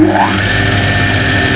ENGINE

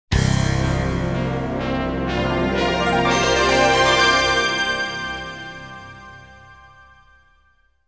Station Call, Sounders, Bumpers; Sounders, Bumpers, CUES & IDENTS; DennisMusicLibrary

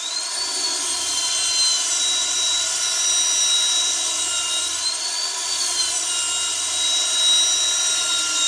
SANDER, CONSTRUCT; AKAI; 1000 Sound Effects

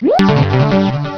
TUNE; Zoemtonen hisses pingen woesjes tikjes

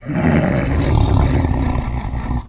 growl

